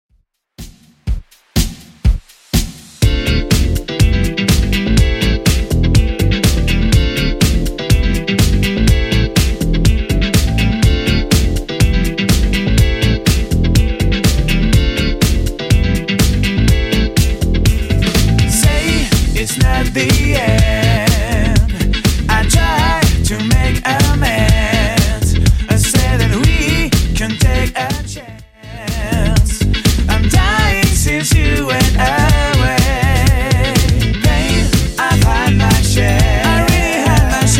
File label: 2ks Redrum 123bpm